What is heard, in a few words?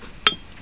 clink